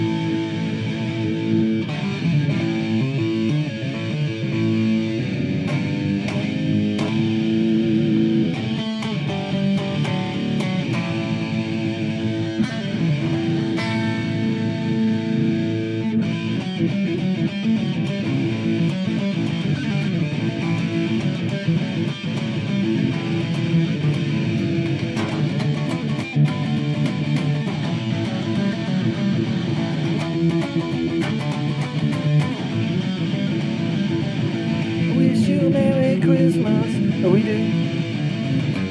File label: rock guitar merry xmas